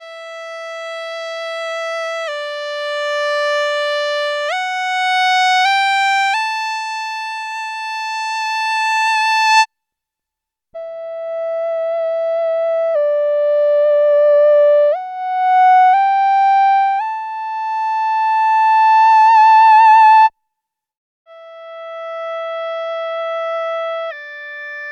Akai EWI4000s Formantfilter

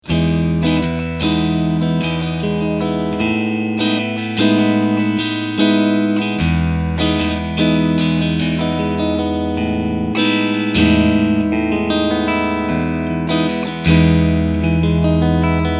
Clean Strumming

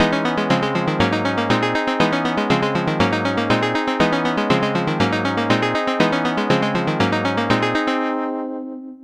Korg minilogue xd

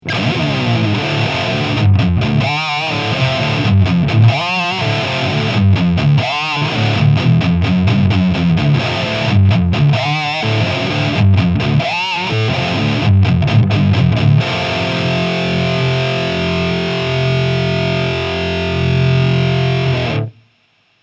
High Gain Whisky Guitar

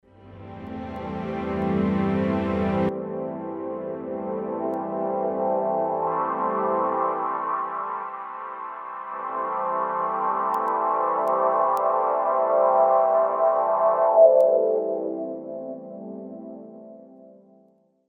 string ensemble filter; NI arkhis

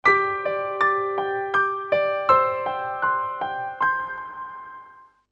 Roland S-330 Sampler Piano 15kHz

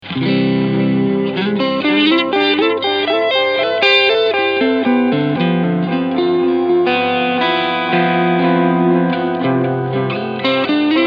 Keeley DDR Overdrive Delay

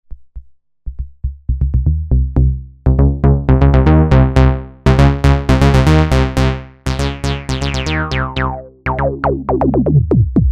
A-105 Filterjam

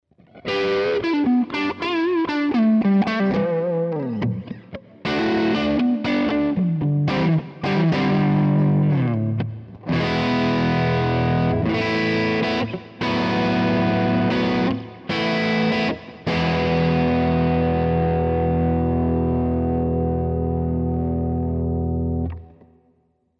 Epiphone Les Paul 50s Neck Crunch

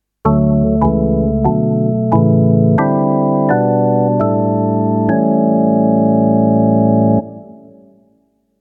Gospel organ

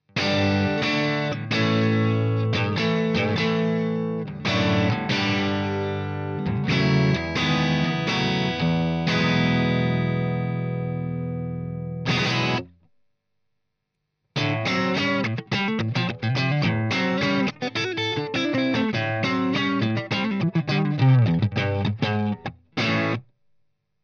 Strat low gain; BRUNETTI Ch2

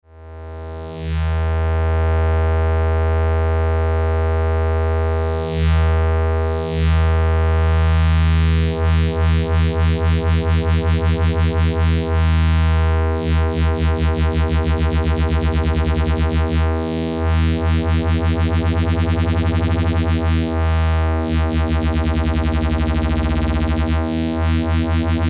Behringer Bode Frequency Shifter 1630 Tremolo

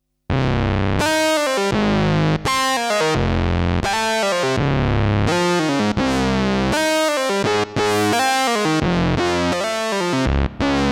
Prophet 10 rev4 Sync Quantec QRS

